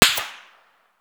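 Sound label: supersonic snap, bullet snap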